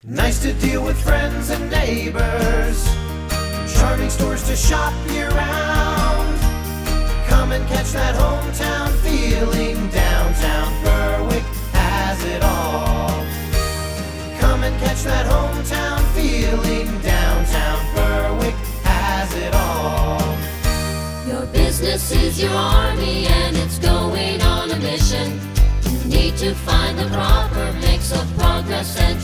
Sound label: Jingles; Community Organization